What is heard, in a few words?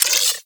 Multi Gun Overcharged Fire; Enemies; Abilities; Warframe; Corpus